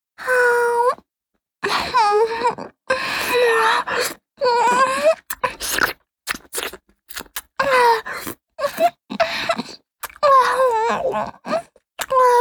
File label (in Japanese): フェラチオ(弱):陰茎を咥え舌をうごめかせる, チュパ音; チュパ音抜粋集